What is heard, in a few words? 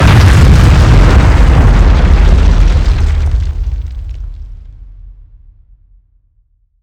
Explosions Explode Ground; DCS